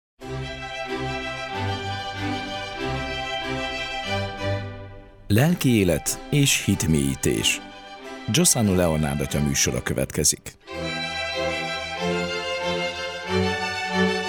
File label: Jingle; Lelki elet es hitmelyites